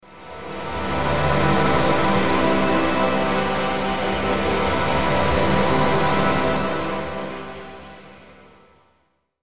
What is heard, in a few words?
Swell Multiple Bright Musical Reverb; Blastwave